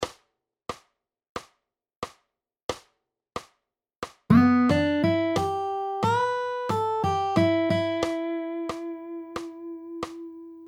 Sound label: Pentatonic Riffs